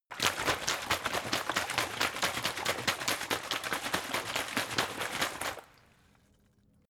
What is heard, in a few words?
bottle water big shake; gastronomie; berufe